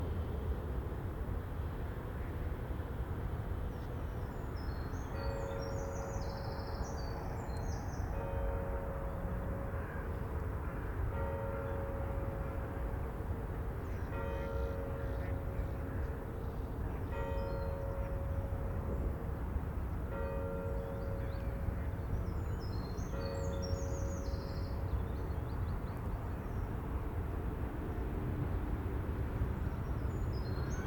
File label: Far Churchbell River